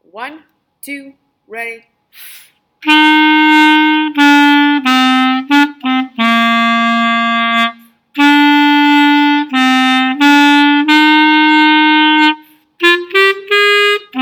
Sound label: gba clarinet